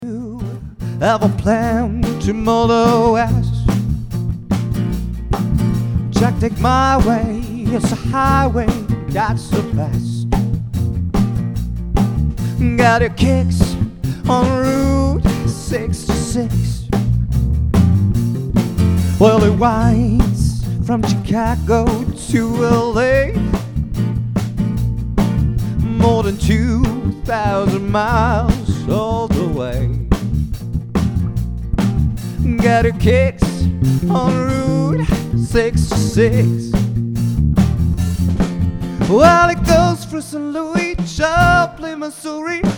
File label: Bass